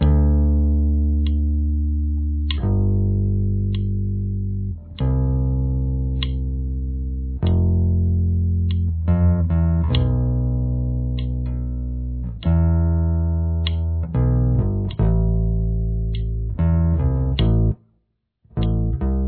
intro bass

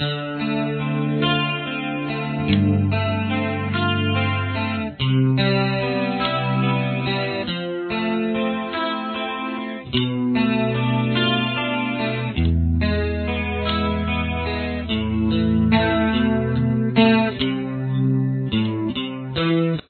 verse guitar

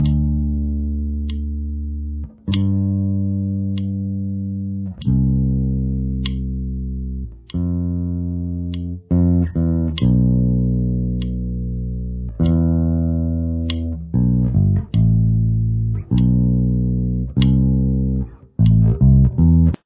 verse bass

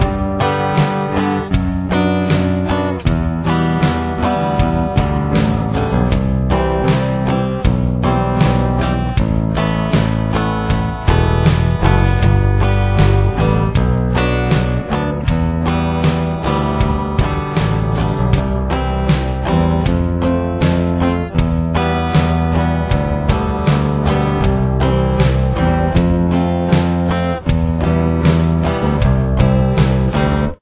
verse mix

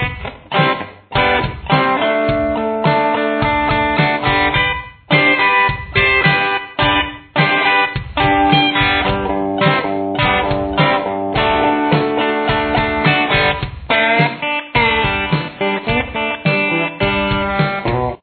chorus guitar

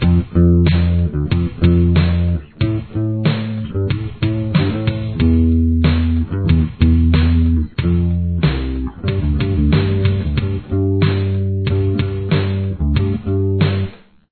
bass verse

